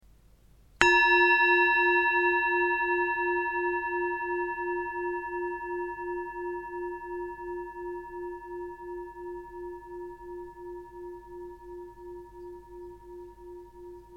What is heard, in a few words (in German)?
Klangschale